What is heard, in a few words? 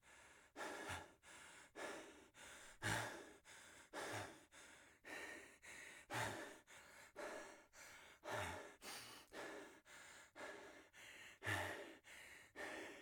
breath ok; Escape from Tarkov; scav1